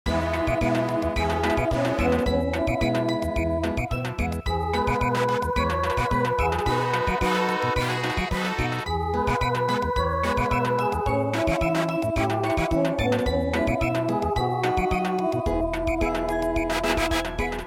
Samba

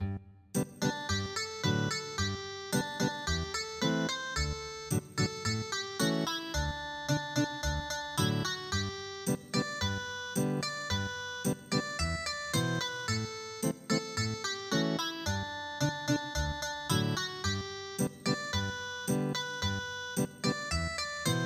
Ethnic Guitar

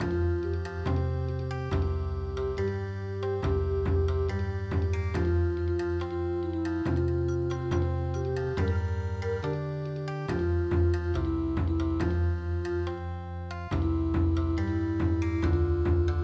Bon Odori

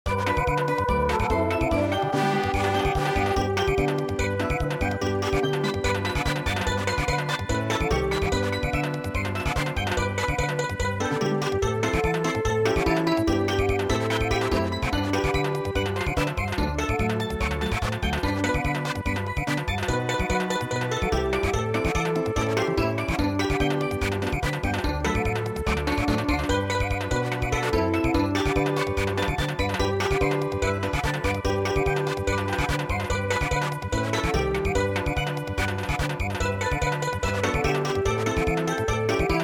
Samba, midi

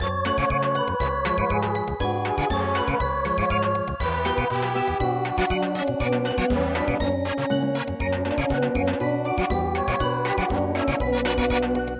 teruteru Samba

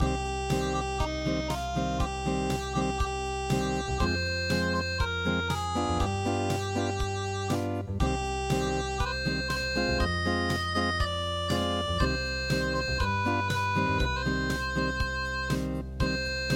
unpluged, midi

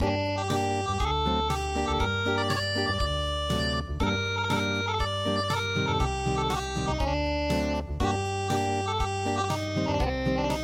Acoustic

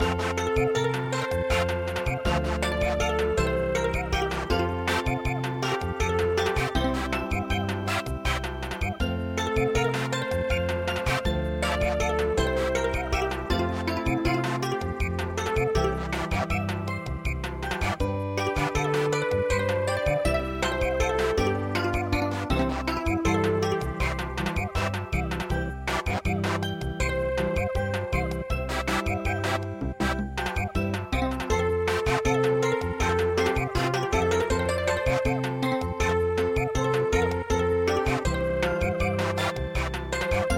Samba, midi; Serenade Gounod

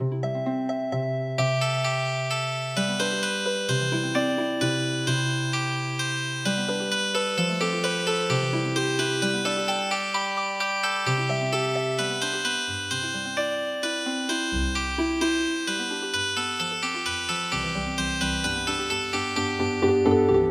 your beauty Healing Harp

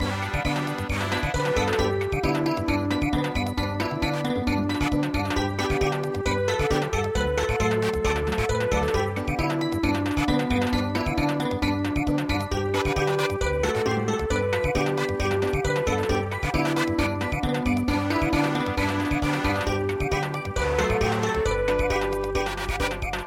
Samba, midi

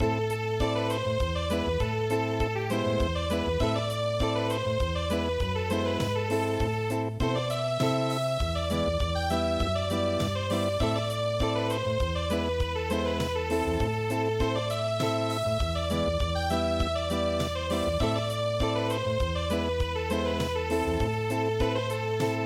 Unplugged

